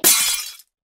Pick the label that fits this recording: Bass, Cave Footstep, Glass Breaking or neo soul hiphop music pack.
Glass Breaking